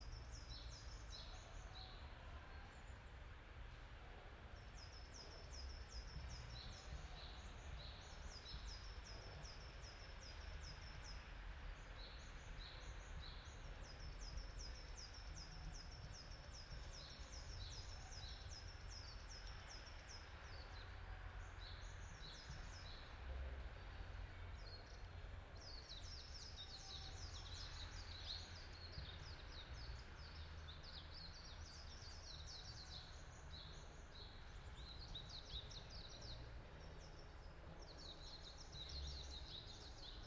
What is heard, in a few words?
yamanakako sound